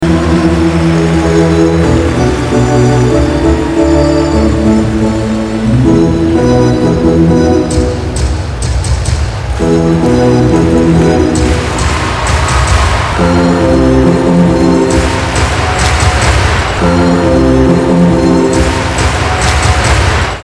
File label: ORGAN; OLYMPIC